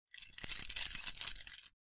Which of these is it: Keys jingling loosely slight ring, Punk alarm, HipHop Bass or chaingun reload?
Keys jingling loosely slight ring